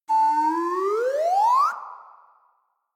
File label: instrumental; Shrek Jr